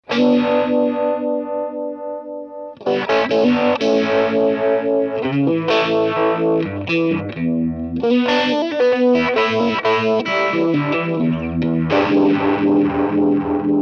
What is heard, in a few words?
vintage mode half speed Cho mode full int